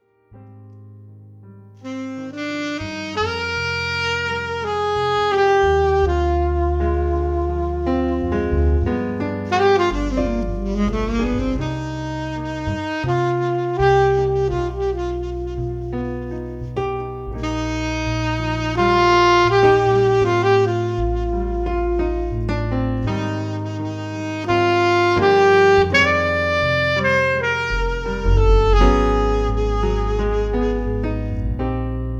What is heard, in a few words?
Keyboard, sax jazz; Lyrica Demo